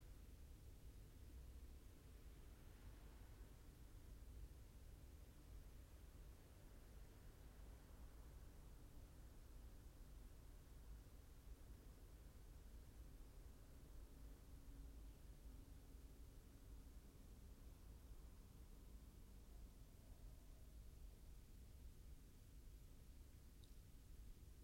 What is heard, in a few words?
yamanakako sound